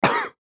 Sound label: Human Cough; Cough Male Fifty Yrs; Human Cough Male Fifty Year Old; Sick; Blastwave